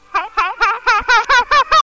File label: mad laugh